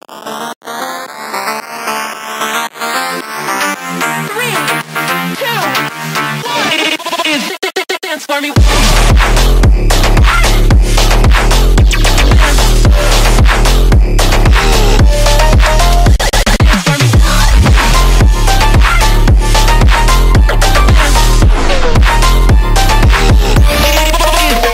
Glitch

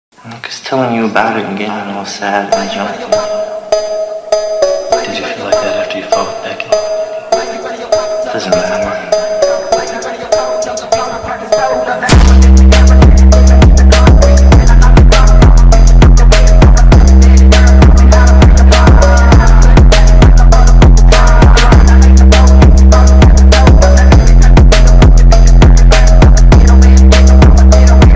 Phonk Drift

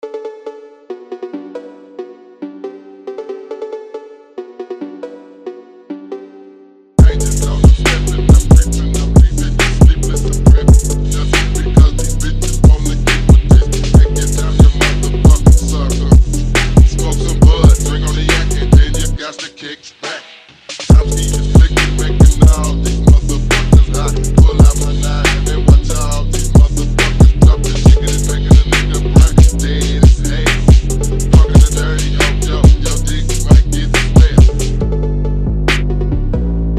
Phonk

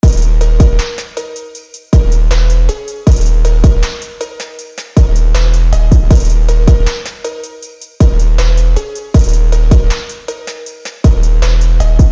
HARD MEMPHIS DRIFT PHONK TYPE BEAT

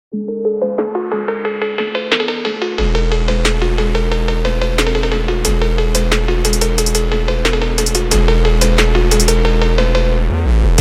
Cowbell Rage

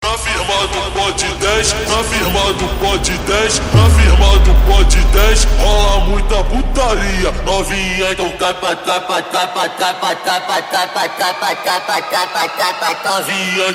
Brazilian Funk + Phonk; Tik Tok Viral 2023

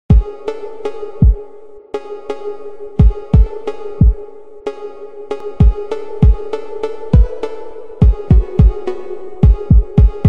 Phonk Drift

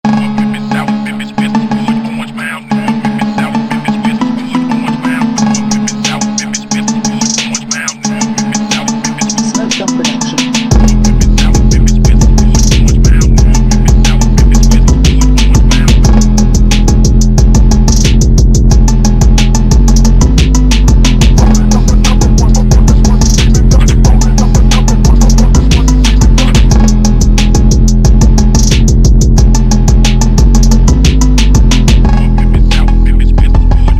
Phonk Drift

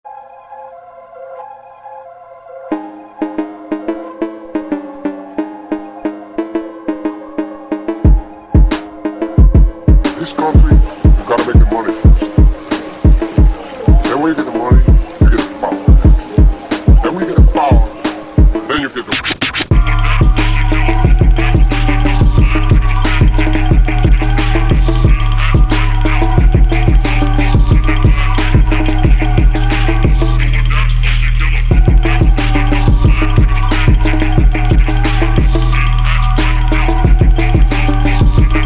phonk drift

